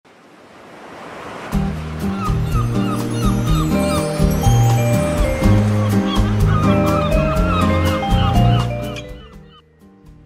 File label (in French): son drop de saison